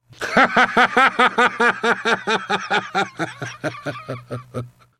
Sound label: man laughing, man bursts out laughing